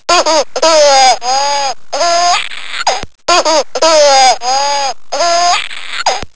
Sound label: baby laugh